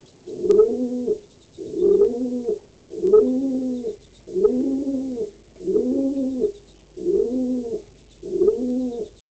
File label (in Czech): holub